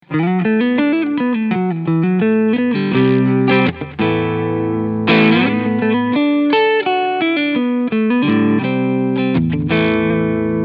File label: Clean Humbucker Front